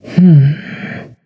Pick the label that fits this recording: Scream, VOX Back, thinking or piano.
thinking